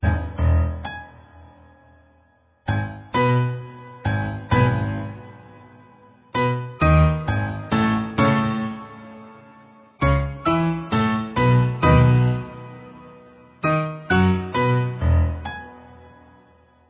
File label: Jangly Piano